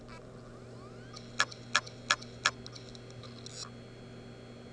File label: hd sounds, laptop, bad; mac disasters